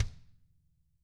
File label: DW HARD, DW KIT; ILIO; Double Platinum Drums 1